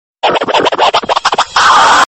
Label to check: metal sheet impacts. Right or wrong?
Wrong